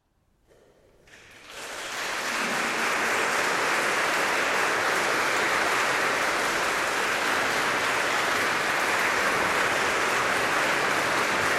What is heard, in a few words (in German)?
Applaus; Benefizkonzert 2015